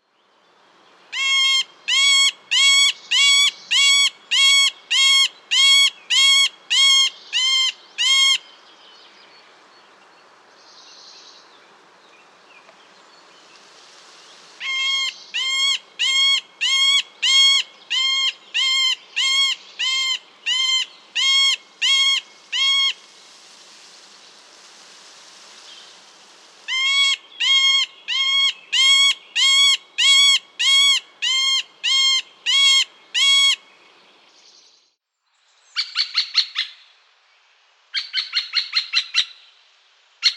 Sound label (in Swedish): mellanspett, fagelljud